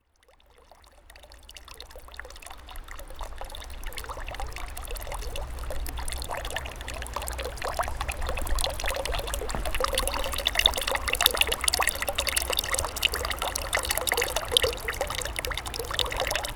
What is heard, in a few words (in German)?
Geplätscher